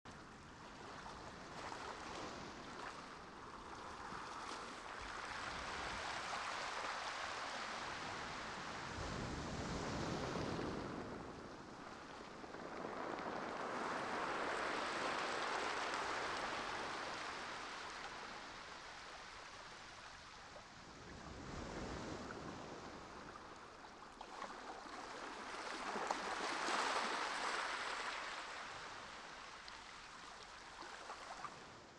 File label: beach; ambient; water